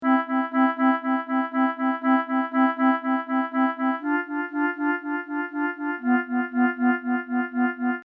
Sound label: ob cl adjusted; masaakiwada